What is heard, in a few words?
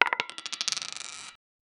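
ivory dice rolling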